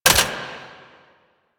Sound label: Sniper Mech React; DE; Warframe; Warframes; Frumentarius; ExaltedSniper